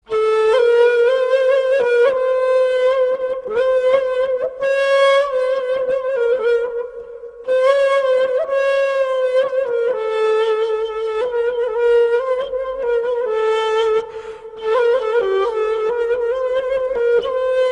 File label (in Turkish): ney dinletisi zil sesi